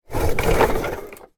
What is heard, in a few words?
toy large plastic car roll across floor